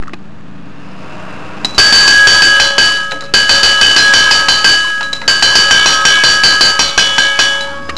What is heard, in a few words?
cowbell